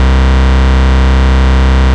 Ultimate Bass Root C; Vengeance Samples

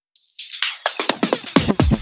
Fill 128 BPM; Vengeance Samples; VEH1 Loops

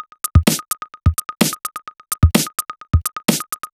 Electro Loop 128BPM; Vengeance Samples

